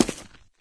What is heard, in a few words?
concrete, player footsteps